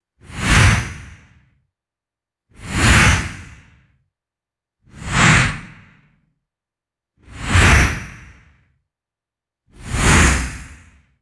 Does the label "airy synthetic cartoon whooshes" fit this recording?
Yes